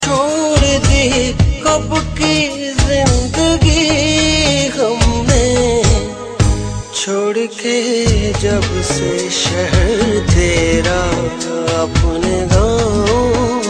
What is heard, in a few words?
new sad